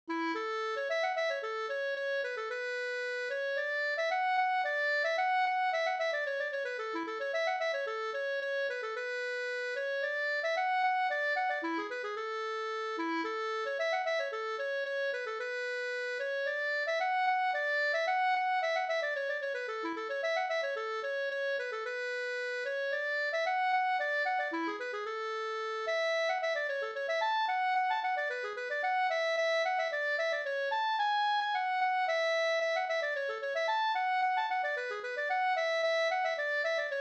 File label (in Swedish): polska Bingsjopolska Tiokronorspolskan; e Hjort Anders e Ola Backstrom